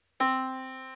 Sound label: yamaha Disk